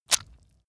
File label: leather impact mud hard squelch